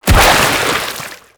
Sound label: Enemy Ink Explosion; Shadowgrapher